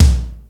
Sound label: CRACKED KI2N, Techno Trance; E-MU Formula 4000 Series Vol. 2